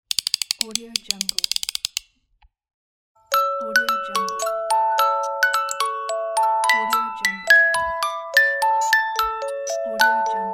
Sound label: silent night music box; dauzkobza